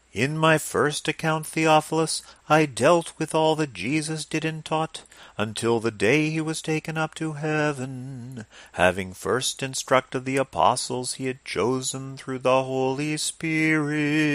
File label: Reading melody ornaments